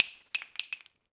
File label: grenade launcher casing stone